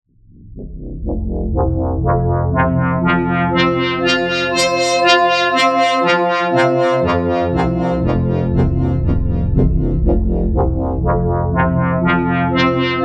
3Sine FM; Sound Particles SkyDust 3D

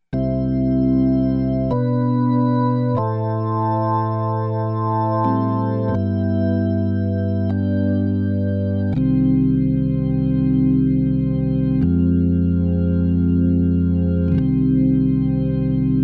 Crumar Mojo Desktop Dark Solo

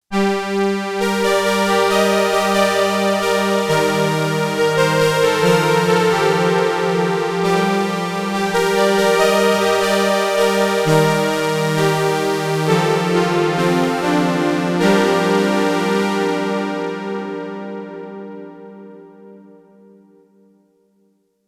Marion Systems MSR2 Poly Long Release

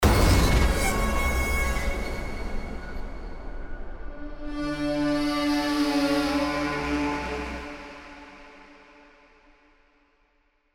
Sound Design Mech Hits

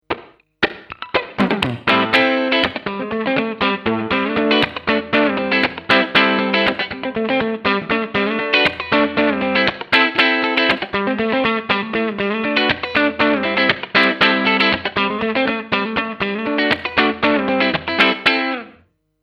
Humbucker Neck Pickup Clean

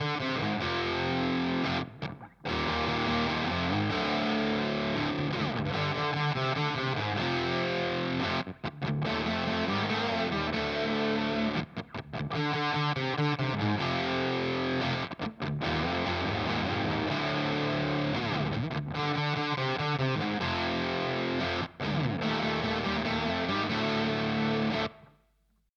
Fuzzface; Boss GT-1000 Core

